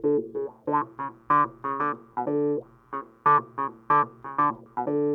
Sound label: clavinet, analog funk